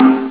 pauke; Amiga Soundtracker sample

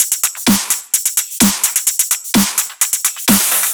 Drum Loop 128BPM FULL ohne BD

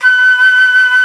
FLOTE SOFT; FLOETE; Best Service; Alpine Volksmusik

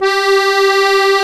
DIATONISCH, AKKORDEON, Alpine Volksmusik; Best Service

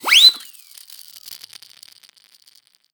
TROETE; Xsample VOL-9

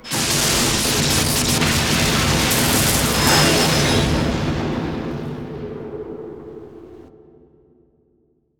DUESEN; Best Service; Advanced Mega Effects

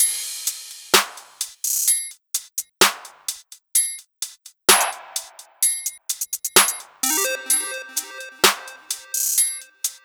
Drum Loop 128BPM FULL ohne BD

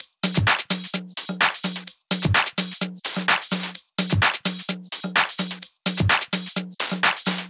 Drum Loop 128BPM FULL ohne BD; Vengeance - Essential Clubsounds Vol.5; VEC5 Drumloops; VEC5 Multi Loops 128BPM

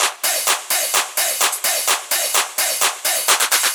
Drum Loop 128BPM FULL ohne BD

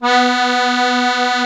MUSETTE, AKKORDEON; Best Service; Alpine Volksmusik

